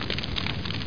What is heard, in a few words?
torch; dosgames; roc